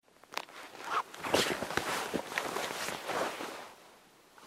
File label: other equip, clothing system